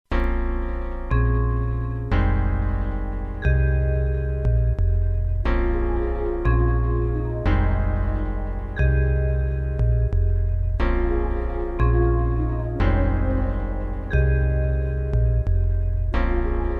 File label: grey days ambient